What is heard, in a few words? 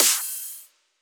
Rides, Cymbals